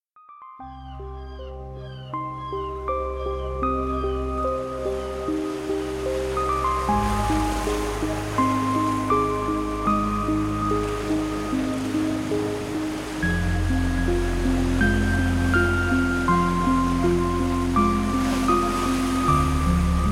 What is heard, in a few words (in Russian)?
zvuki priboya morya na fone muzyki